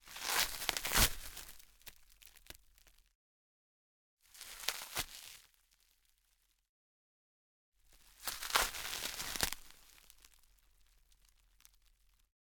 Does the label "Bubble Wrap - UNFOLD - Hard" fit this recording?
Yes